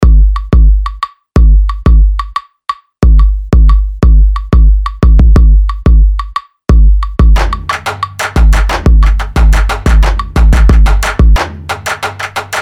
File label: BEATS